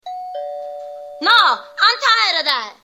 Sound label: doorbell regular; SNL